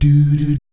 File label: DOO DOOT, Jazz